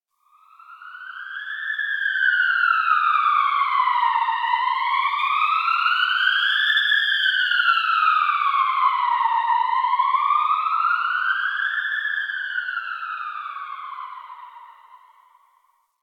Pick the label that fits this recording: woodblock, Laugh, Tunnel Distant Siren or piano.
Tunnel Distant Siren